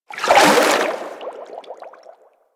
melee whoosh; Warframe; Vehicles; Sharkwing

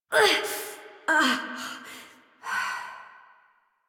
Death Adult; New War; Adult Operator

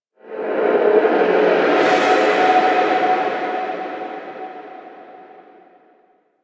Shrine Ghost Cry B; Warframe; Revenant

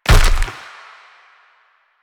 Step Default; Warframe; Proto Infested Runner

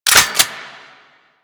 Reload; Gotva Prime; Warframe; weapons

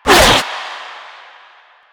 Runner Arm Attack; Enemies; Warframe; Proto Infested

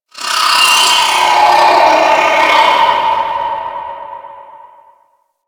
Infested Hive One Off; Ambience; Props; Warframe; Corpus Infested

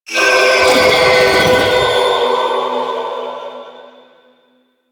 Sentient Aerolyst Death; Warframe; Sentients

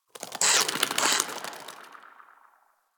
Tree Critter; Ambience; Props; Warframe; The New War; Zariman; Quests

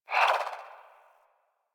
Swing, Melee; Balance One; Warframe; Weapons; Modular Melee

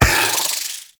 Proc Poison; Mods

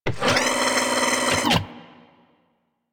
Foundry Motor Forward; Warframe; Liset; Hub; Machines; Tenno Foundry

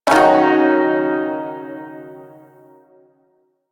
Shawzin Yo Chord D, Music; Chords

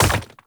Hand Bomber Proj By Bounce; Warframe; Man In The Wall

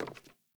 Sandals Walk Wood, Footsteps; Characters; Player

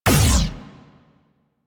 Gatling Laser Shot; Railjack

